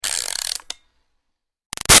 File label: Toy Music Box Wind Up Fast; Wind Up Toys